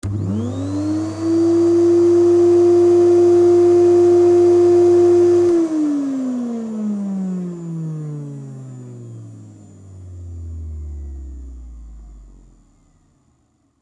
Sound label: vacuum cleaner